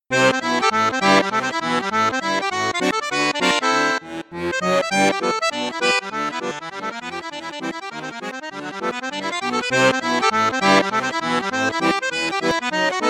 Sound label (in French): registre 16 8 accordeon; cavagnolo artiste 3